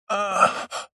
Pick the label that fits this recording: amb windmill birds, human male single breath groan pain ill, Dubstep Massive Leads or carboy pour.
human male single breath groan pain ill